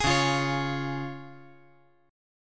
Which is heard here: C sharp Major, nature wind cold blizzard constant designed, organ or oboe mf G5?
C sharp Major